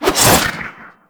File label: Board Sword Throw; Warframe; Weapons; Sundial